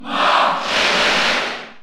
Cheer French; Marth; SSBU

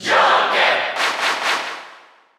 Cheer Russian; Joker; SSBU

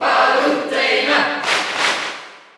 Cheer Dutch; Palutena; SSBU

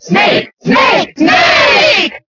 Cheer Italian; Snake; SSBB

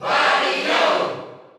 Cheer Dutch; Wario; SSBU

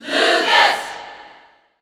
Cheer English; Lucas; SSB4; SSBU

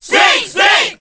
Cheer Korean; Snake; SSBB

